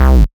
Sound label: Bass C, Offbeat Bass